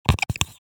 Whiteboard marker office stationery writing screech